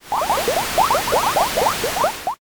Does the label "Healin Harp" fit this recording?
No